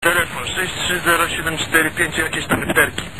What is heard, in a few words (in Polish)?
telefon i jakies tam literki